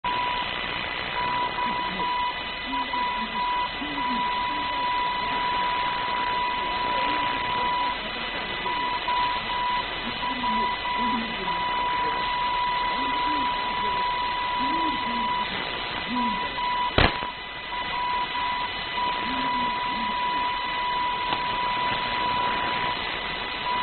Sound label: 207kHz